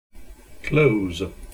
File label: close